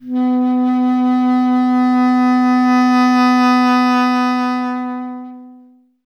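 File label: wind bass clarinet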